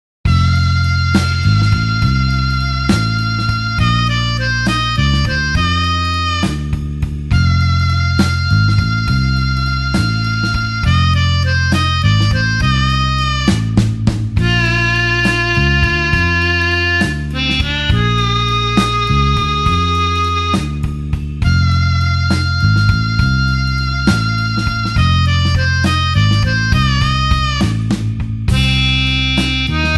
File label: blues harp slow F